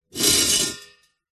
industrial gas canister metal scrape on gritty concrete surface; zapsplat